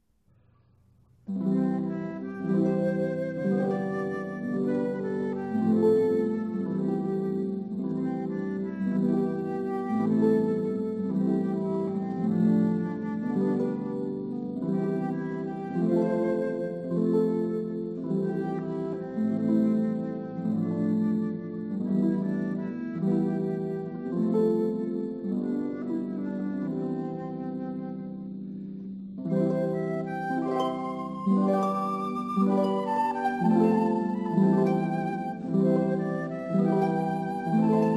flute harp; traditional morning has broken

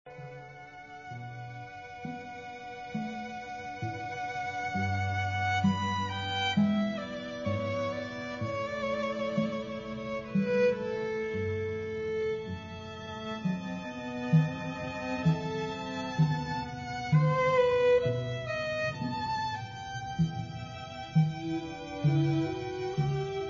Quartet air in g; bach

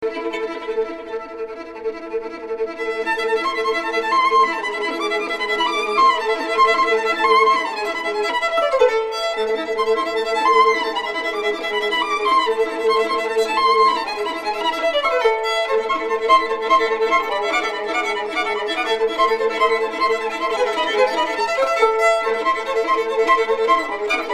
violin duo

